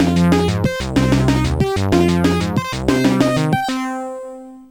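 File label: rhythm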